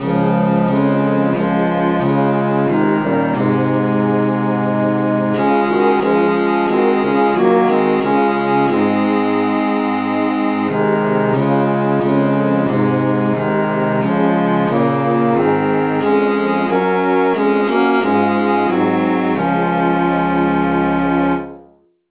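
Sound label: saint thomas traditional key d flat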